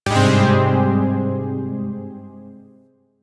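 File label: Shawzin Minor Chord K; Zariman Shawzin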